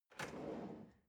drawer large trash draw short

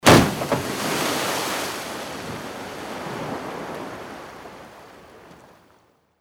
car falling into water

